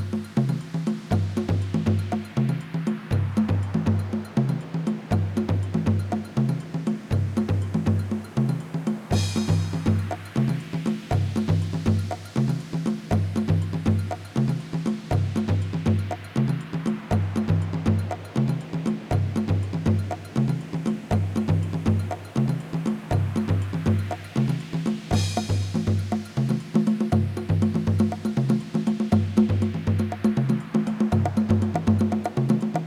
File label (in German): Energie und Rhythmus